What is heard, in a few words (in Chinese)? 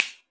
响指; 流子